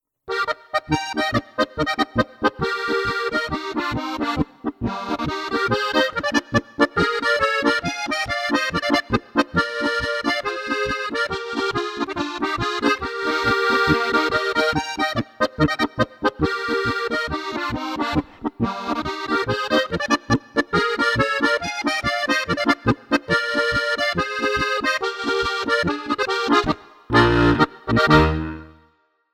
harmonika schnell polka

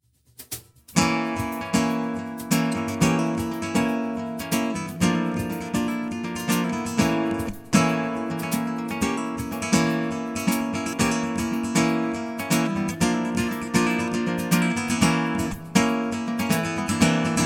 Konzertgitarre Easypick Flamenco ohne EQ Mono

